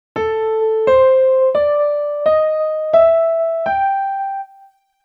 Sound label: A Minor Blues Scale, piano